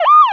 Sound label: siren; NFS3DEMO